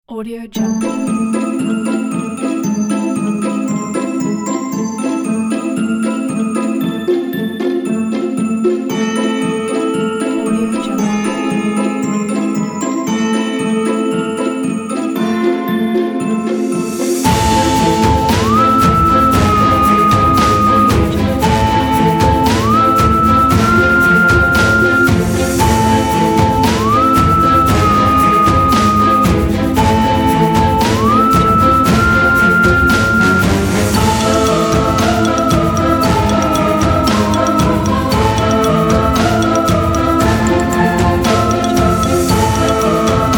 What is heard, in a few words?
halloween